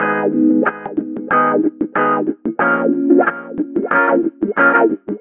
GTR, Roots Funk; USB Soundscan vol.41; AKAI

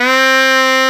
Shenai C3; Multisamples; Digital Sound Factory Planet Earth

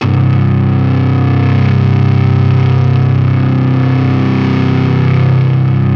B-3 organ; More Organs; Keyboards of The 60's and 70's

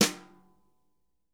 BRSNR, drum

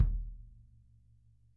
kick, Drum Kits

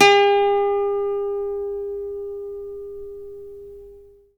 LUAN G4; Spectrasonics; Heart of Asia

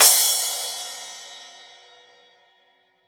raw crash; acoustic drums; USB Soundscan vol.10; AKAI; 90s sample CD

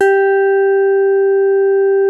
SIDE MID G4, SYNTH PLUCK

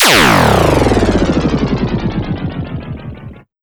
FX, Big Beat Drumloops; USB Soundscan vol.25; AKAI

